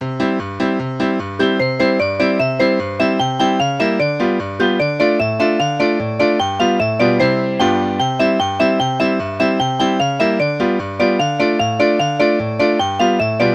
background; RS Games Sounds; Dreidel

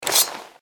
knife draw; Original TK Sounds

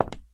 tile step; Golden Crayon Sounds

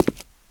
concrete step; Golden Crayon Sounds

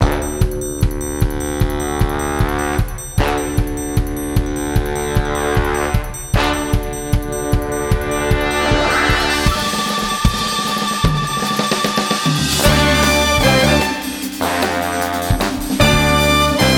encounter reporter extended; Manamon Music; Manamon 2

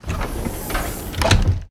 door close; Golden Crayon Sounds

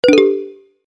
Drill; business scheme beta 2

